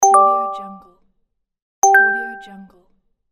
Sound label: notification